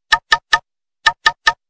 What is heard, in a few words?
Knock; KM380